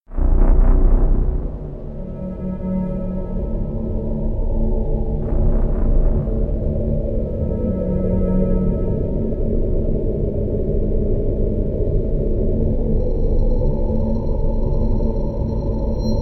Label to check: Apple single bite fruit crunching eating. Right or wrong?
Wrong